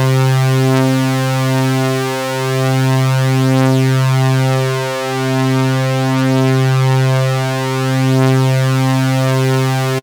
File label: Synth, Raw Analog Multi's; Dream Zone